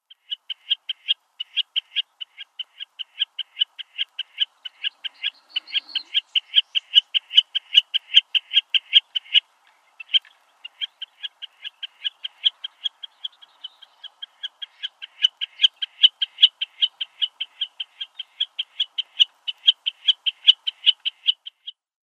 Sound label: Common Snipe display